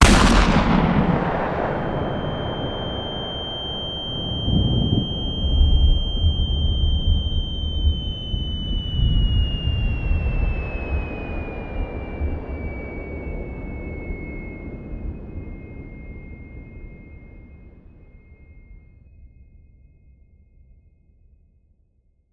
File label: shot tinnitus